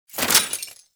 Mech Release, Rifle; Thanotech; Trumna Prime

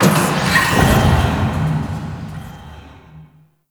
Machine Lift; Ambience; Warframe; Corpus Railjack

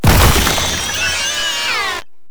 Door Ink Blob Explosion; Gameplay; Warframe; Shadowgrapher

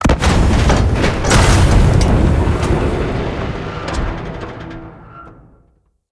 Siege Engine Destroy; Weapons; Enemy; Artillery; Ode Siege Engine

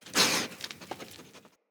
Wolf Cub Standing Idle Grunt, Animals; Vocals; Orengal Wolf Cub

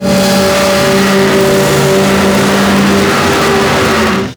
Sentient Vessel Movement Limb, Enemies; Warframe; Tau

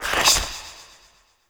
Shot Mech; Warframe; Noctua; Weapons

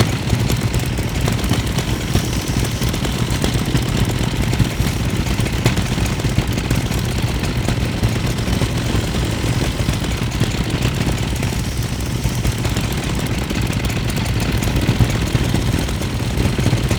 Ghoul Saw Idle Loop; melee; weapons; Warframe; Grn Ghoul Saw Player; Kuva Ghoul Saw

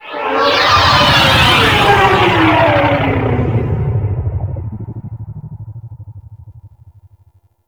Fly By Slow, Vehicles; Flight; Warframe; WM Liset

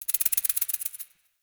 Interior Spider Crawling; Ambience; Warframe; Duviri; Murmur

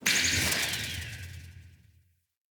Fey Arm Bird Parry Cast; Powers

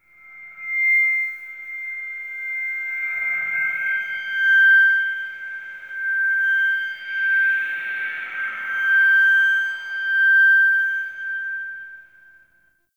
Interior Stadium Feedback; Warframe; Ambience; Nineteen Ninety Nine

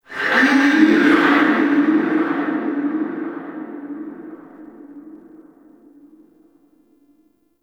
Stadium Infested Medium; Warframe; Ambience; Nineteen Ninety Nine

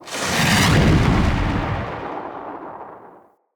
Lightning Blast Cast; Enemy; Abilities; Nimrod

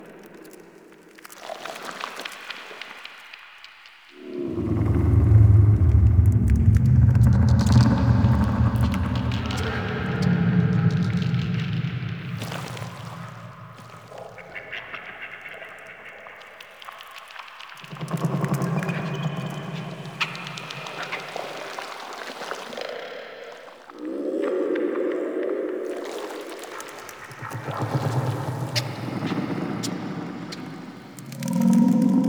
Exterior Hub Loop, Ambience Infested; Warframe; Infested Eris; Amb Loops Exterior

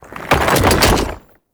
Concrete Archgun Charged React; Warframe; Archwing

